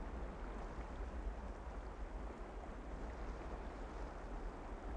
Low Amb; The Sum of All Fears

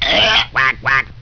gagqak

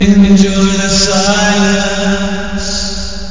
silence; Amiga 8-bit Sampled Voice

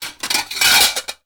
dishwasher load; Singles; French Version

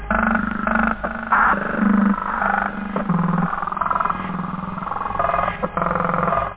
computer; da capo vol1

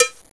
cowbell

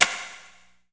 mouse; Bombardier

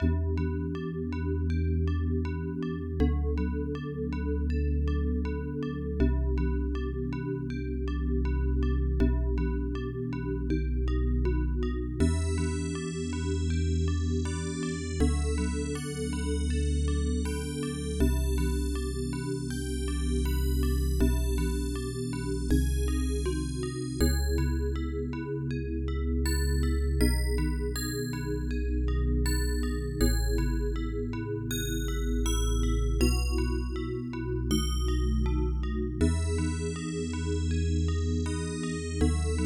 LULLABY

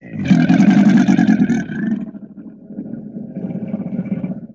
dragon; murk

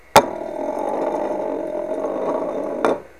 Ball return, Ultimate Pool

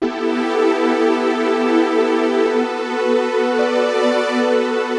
Synt

